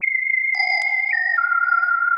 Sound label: Quasar Whistle 110BPM; sci-fi; Loops